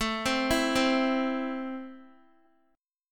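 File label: A Minor, Guitar, chord strum; x,12,10,9,12,x; arpeggiated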